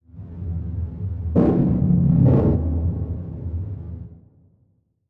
Title Transition Blast; Production Element Title Transition

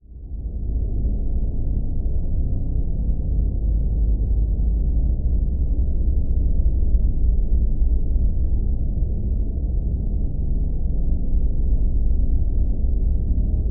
Drone Deep Pulsing Reverb; Production Element Imaging; AdobeCC

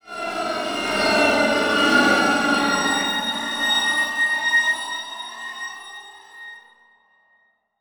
Transition Whoosh Warble; Production Element

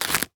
Horror Scary Bone Crunch Quick

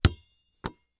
Sports Basketball Bounce Catch

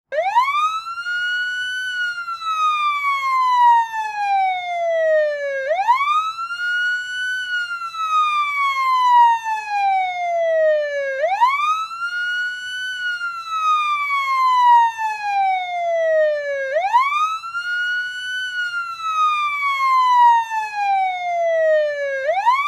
Emergency Siren Police Wail; emergency effects; AdobeCC

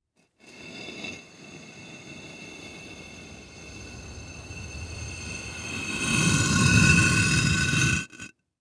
Impact Brick Drag Through Sand Slow

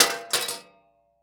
Weapon Knife Fall On Metal Ground; AdobeCC

